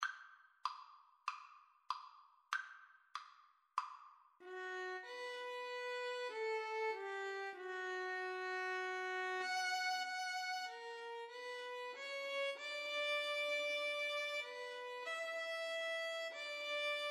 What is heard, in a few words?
violin cello, Violin-Cello Duet; el cant del ocells